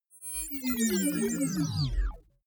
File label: screen shut down glitch; energie